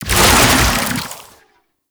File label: Melee End; Infested Oni